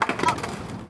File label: Skate Park Skateboard, percussion; HOUSTON; Jamail